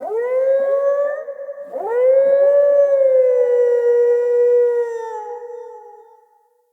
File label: Wolf Cub Howl Stinger; Vocals; Animals